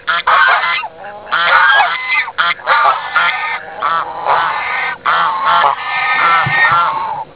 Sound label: Geese